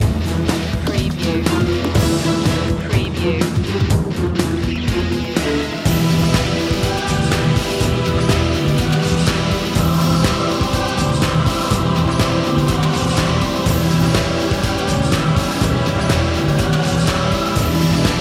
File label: rock mix